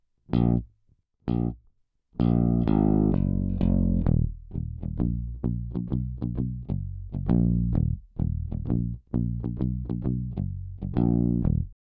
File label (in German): EBass Komp